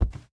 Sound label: Carpet